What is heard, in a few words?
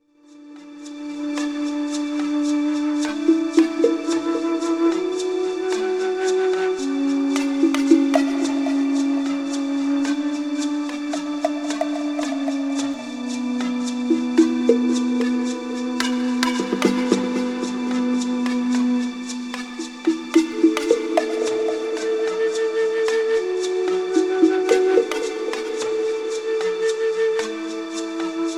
peaceful rainforest; Berlin Production Music; animals arbeitsam coltish dschungel hardwork